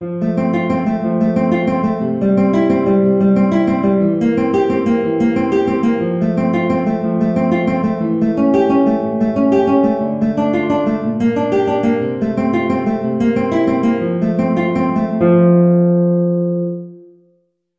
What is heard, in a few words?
Prelude In F, guitar